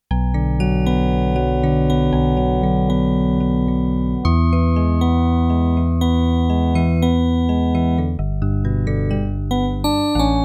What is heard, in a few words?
METALLIC PIANO, Korg DW-8000; Factory Patches